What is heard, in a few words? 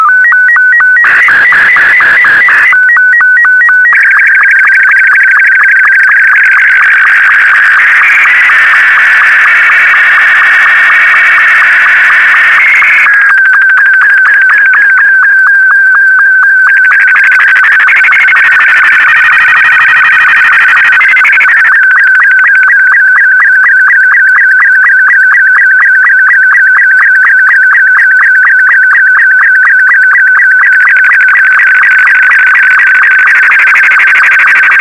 SSTV, W260; VK4MSL